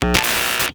Screen Static; Cephalon Tweacher; Warframe; The New War

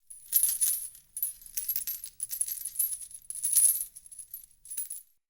Witch Station Material Texture, Ambience; Soul Plane

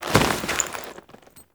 Kuva Lich Finisher Foley; Warframe

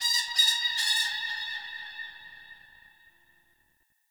Forest Bird High; Warframe; Grineer

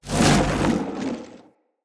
Casting Device Projectile Whizz By Heavy

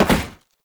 Step Metal; Warframe; Oraxia; Foley

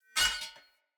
Short Sword Whoosh Accent; Weapons; Player

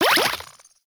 Pick Up Energy; Warframe; Caliber Chicks Minigame; Pick Ups

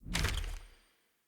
Map Close C

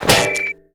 idle head impact; Warframe; Fortuna; Zuud

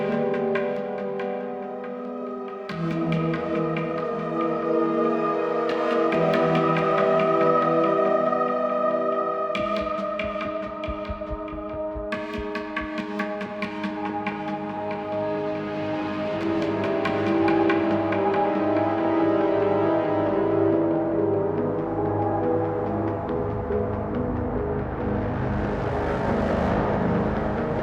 Merchant Customization Loop; Hubs; Veilbreaker

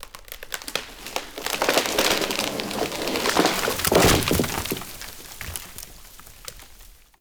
Forest Tree Fall; Warframe; Awakening Remaster

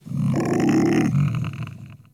Kubrow Tame Interact Affection Closed Mouth

